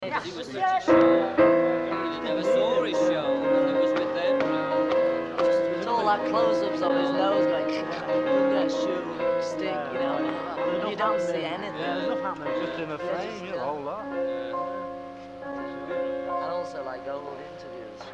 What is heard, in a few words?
Instrumental